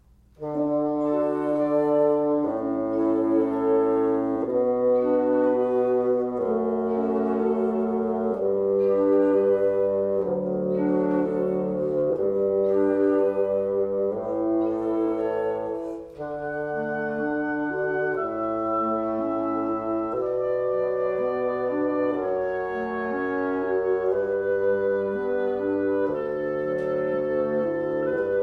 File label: Canon in D